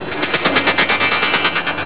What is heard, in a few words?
invincibility; pang